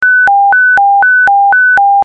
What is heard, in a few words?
Fire Paging